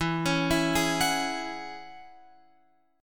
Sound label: E 6th Add 9th, Guitar, Open F; arpeggiated